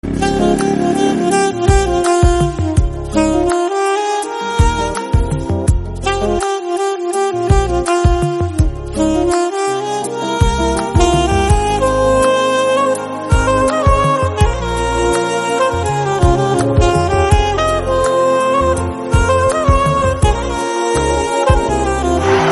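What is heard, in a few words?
love song instrument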